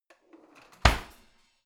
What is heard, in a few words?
old drawer creaky in half